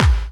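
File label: snare drum bass drum, Dance Mega Drums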